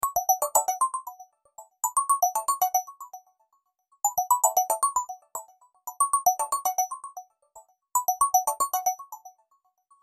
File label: incoming call